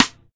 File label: Roland BK-5 Rimshots CStick